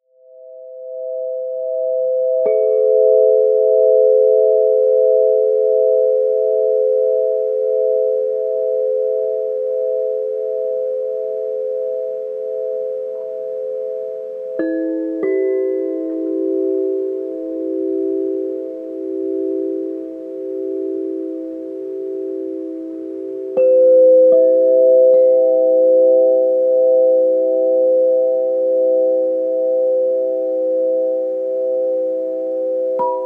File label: Planetary C Crystal Harp; 432 Hz; sound healing; practitioner guide